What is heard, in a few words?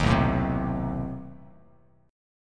B 7th Flat 9th B down, Bass Standard; B7b9 strummed; JGuitar